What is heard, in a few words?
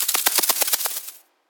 butterfly disappear; Butterfly Lovers; wazdan